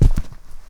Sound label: Footsteps Rug; backrooms 2d android ios online windows gamerip